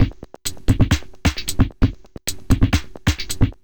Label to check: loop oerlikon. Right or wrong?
Wrong